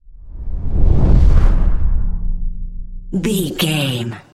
cinematic deep bass pass whoosh

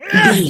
voice female attack

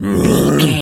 zombie voice grunt

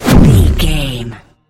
sci-fi shield power deflect boom

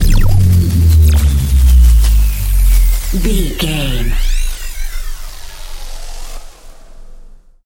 sci-fi electric pulse power down

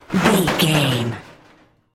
metal sheet impacts

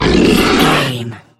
troll monster growl

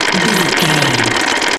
gun auto barrel spin slow loop

